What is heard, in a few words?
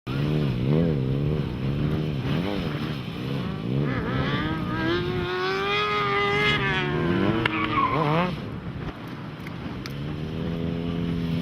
motorcycle racing